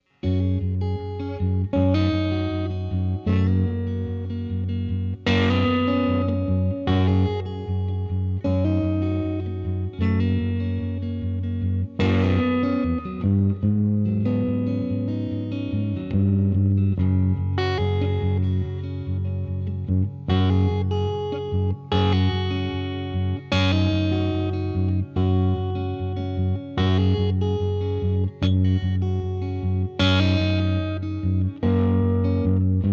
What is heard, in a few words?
Clean Neck Am Std Tele